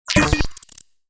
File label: UI SFX; UI SFX Pack; parasitic-god